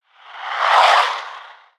Ice Oneshot; Spinjitsu

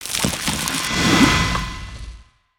Maelstrom Geyser; spaceship

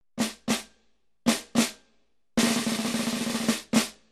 snare

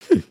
bored hmmph; emotes

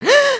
surprised

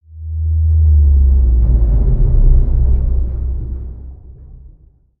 Pillar Collapse

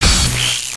Plas Blast

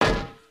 Spider Cage Yank; creatures organic

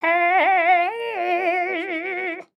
Frog Hat Sing; creatures organic; Ribbit Frog

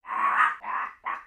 Pets Skunk Idle Bored; creatures; Lego

